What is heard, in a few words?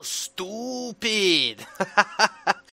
sub alert